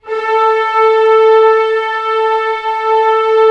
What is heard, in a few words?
orchestra A3 FF; Sound On Sound - Peter Siedleczek's Orchestra